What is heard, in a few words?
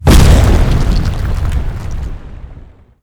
meteor impact; weapons; dota; invoker